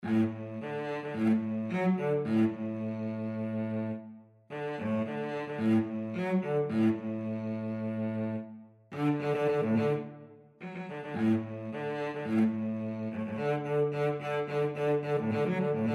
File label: cavalry last post, cello